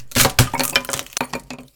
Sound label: crack; Apotheorasis